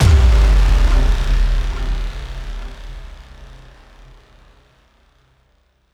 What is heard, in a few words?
Impact